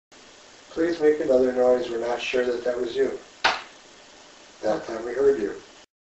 furniture room requests a noise be made and a loud cracking sound